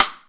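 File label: snare drum side; SD SIDE; East West - Global Sounds; RY30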